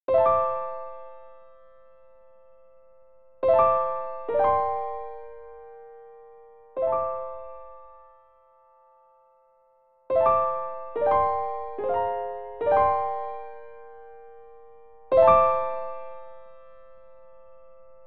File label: Ice Cavern Piano; The Legend of Zelda Ocarina of Time